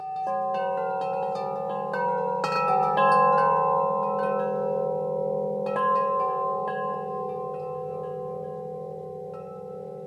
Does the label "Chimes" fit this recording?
Yes